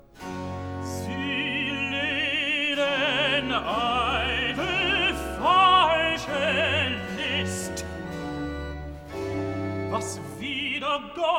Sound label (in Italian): Recitativo (Tenor); Cantata, BWV 2 'Ach Gott, vom Himmel sieh darein'; Bach; Paris; 2nd Sun After Trinity